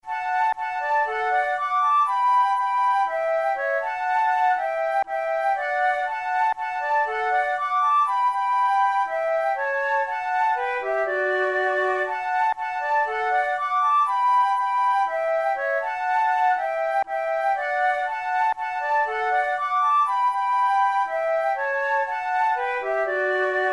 duet; Oh come where the roses

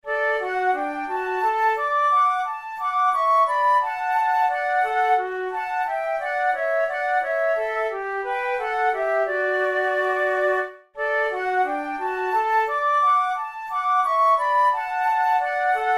duet; weigl; leonore

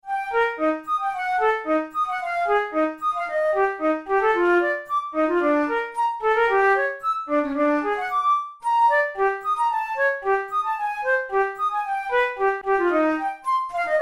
studies for flute solo; karg-elert

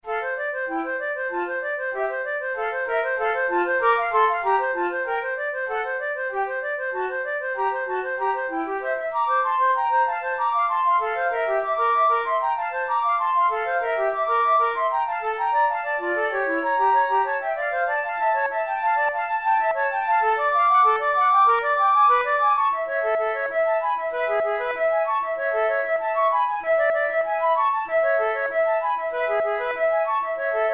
flute; school of flute grade1

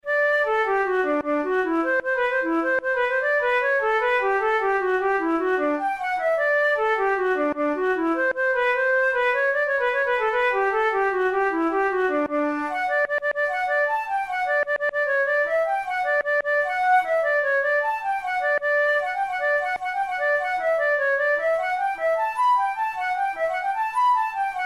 reel, flute tunes

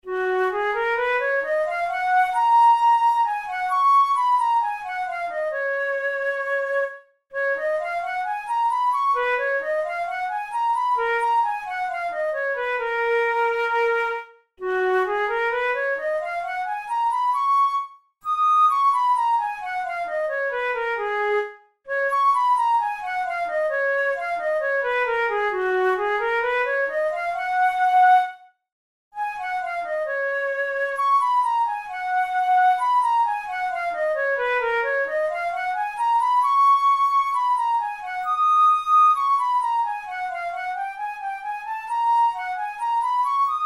studies for the boehm flute; drouet 72

